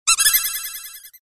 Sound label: Robotic Whistle